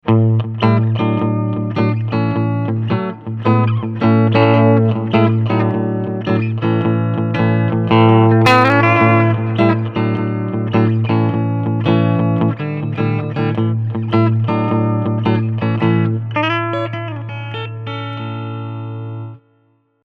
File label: clean Blues